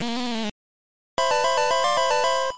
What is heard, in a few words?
game over, tetris; tetris window